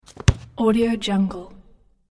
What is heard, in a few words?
football kicked